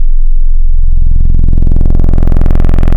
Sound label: Plastic No Res, wavetables; Waves Codex